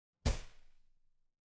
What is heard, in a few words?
impact punch